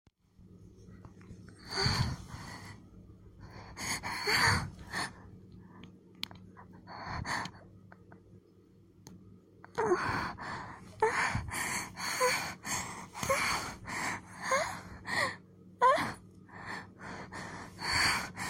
Moaning alone

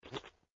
Suck; Lewd SFX

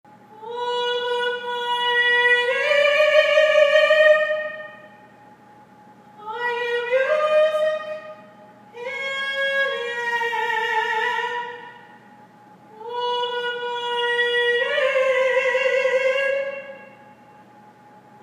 [singing][spiritual][acapella]; something simple

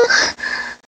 Moan

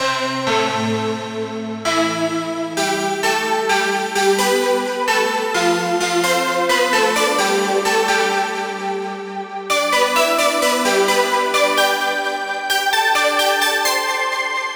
Bell

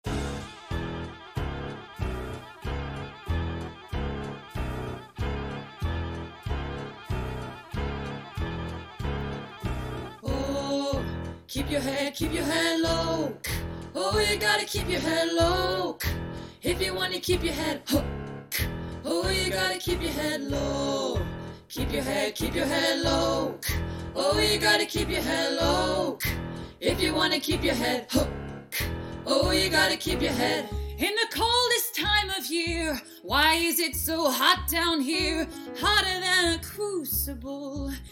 F, Chant

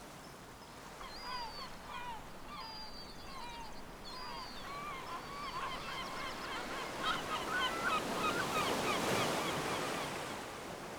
Beach Ambience

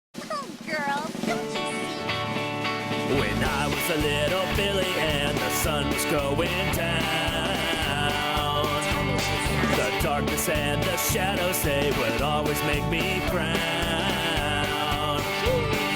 ska rock cover

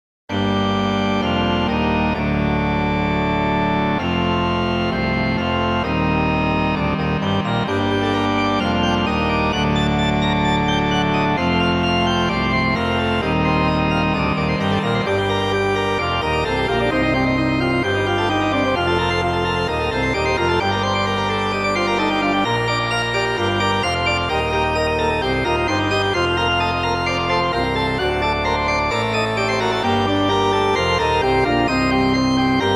Bagpipe, Overture

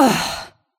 Grunt; Lewd SFX

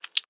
Sex Slide

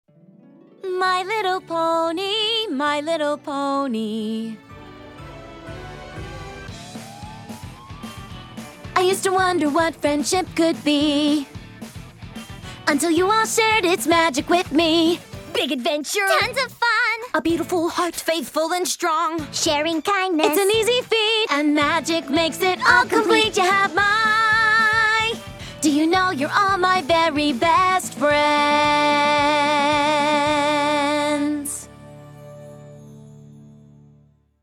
Acapella; My Little Pony Theme Season 2 Mix

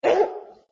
Gag; Lewd SFX

